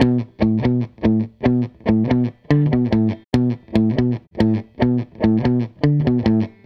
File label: SG Guitar Loop